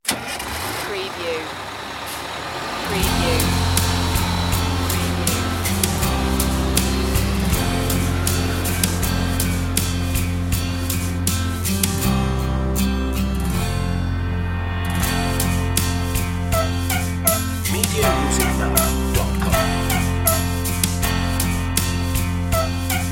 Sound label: the wheels on the bus underscore